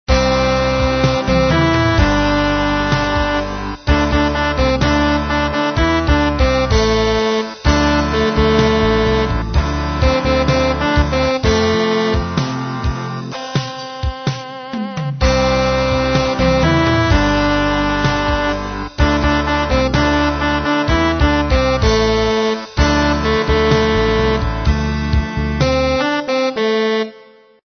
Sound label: midi